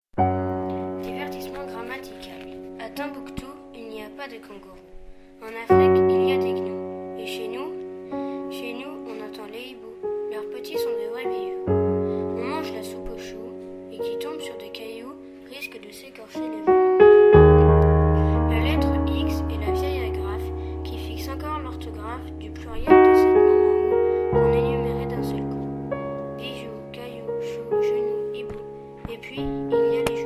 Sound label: piano